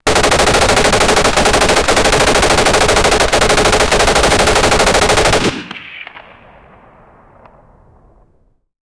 120mm, machine gun

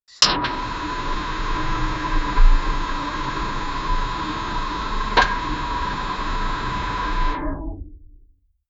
hydraulic pressure releas, metal grinding

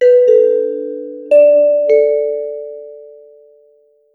gentle confirmation sound, digital

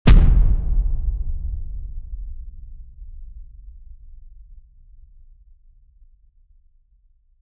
short impact sound soft p; combat

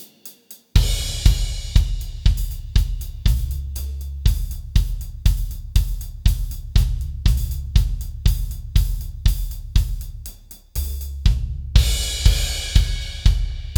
jazz drums

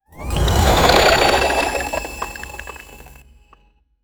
cartoon fantasy ice nova

